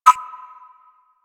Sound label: Hub Concourse Computer Beep